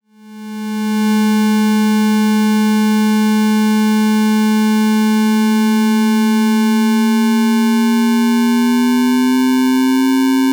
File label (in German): E-RM Polygogo Cycle On Parameterfahrt Roll